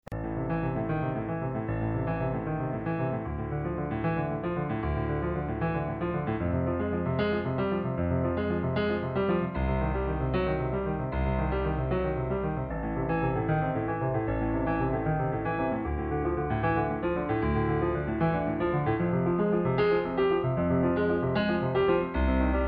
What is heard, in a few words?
piano